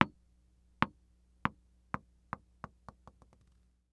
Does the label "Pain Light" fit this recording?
No